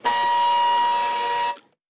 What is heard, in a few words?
servo small, sci-fi sounds, mechanical